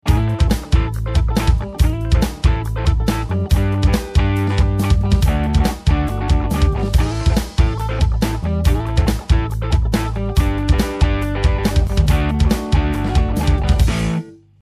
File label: fuzz; MEK Classic Fuzz CF-1